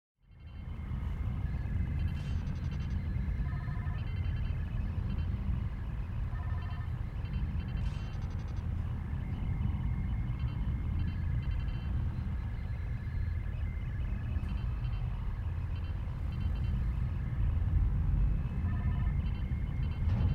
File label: Bird Of Prey Bridge Explosion; star trek